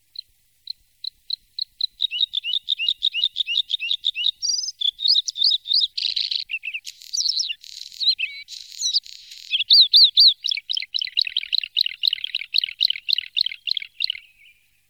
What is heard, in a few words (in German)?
Singvoegel Blaukehlchen